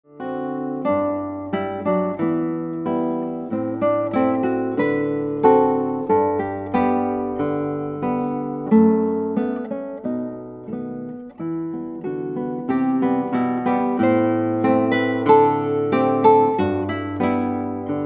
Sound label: Guitar